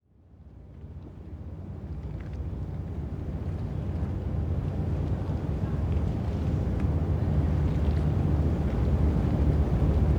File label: hangar ships passby